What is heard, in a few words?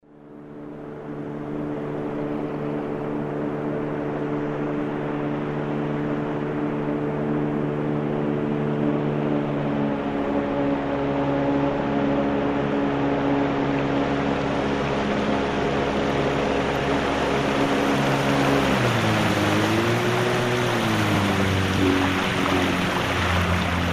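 motorboat sound passes by and shuts off; Моторная лодка, катер и яхта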